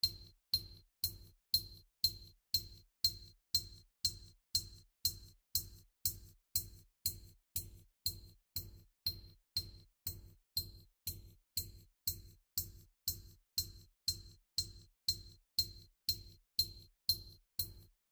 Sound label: HiHat